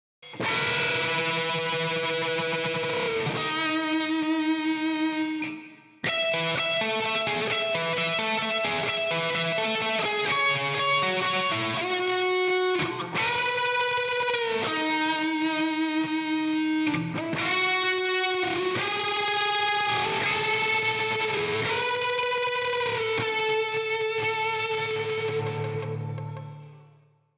lead solo

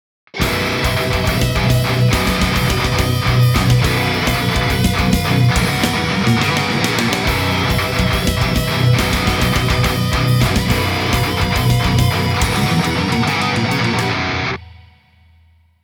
something metal mix

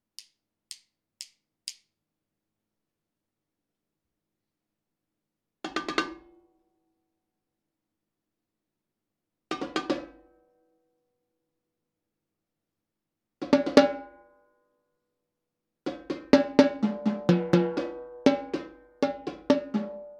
tenor drums, marching band

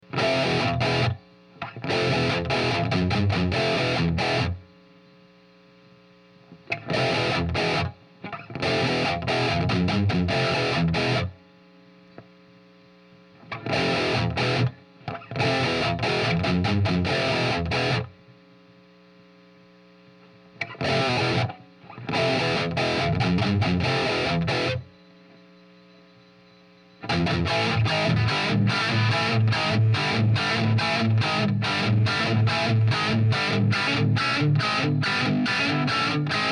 guitar; guitar amp

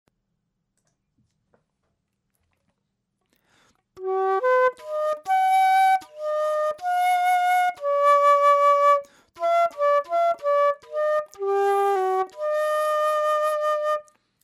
flute hpf; low signal on mic

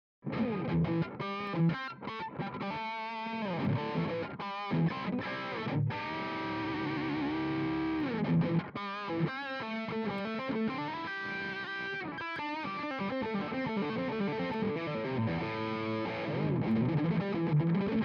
dynamic dry, micing amps

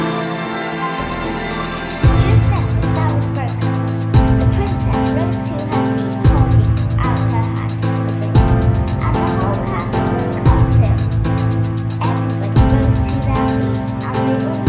leave a message kick example

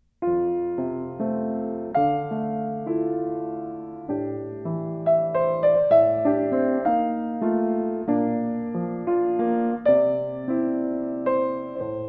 piano recording; nt5

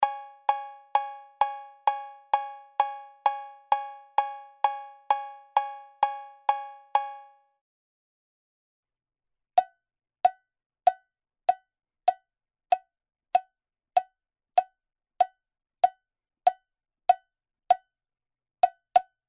cowbell

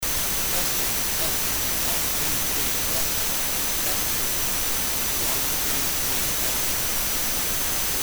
pc preamp gain

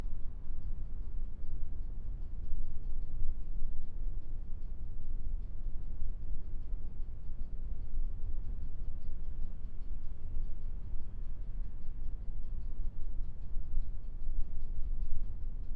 acoustic

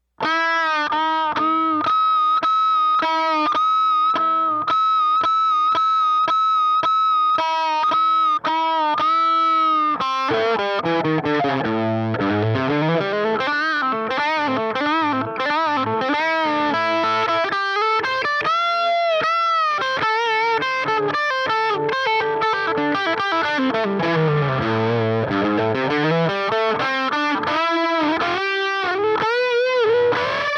jumped plexi

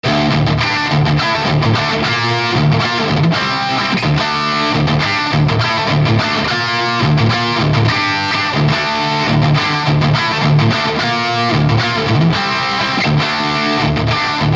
marshall driven

